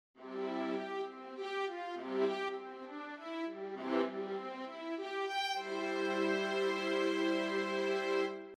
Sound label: Viola